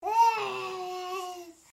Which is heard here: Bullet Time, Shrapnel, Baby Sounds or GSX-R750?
Baby Sounds